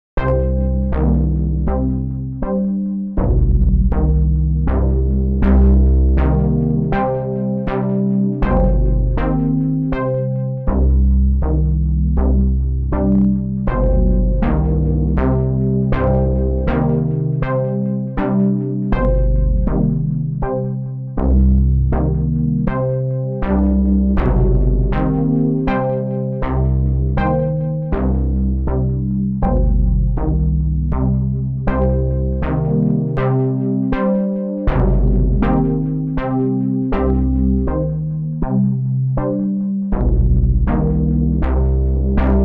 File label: Pedal Delay Synth Tremoloed Delay